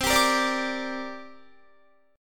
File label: C 7th Suspended 2nd C down Guitar; C7sus2 strummed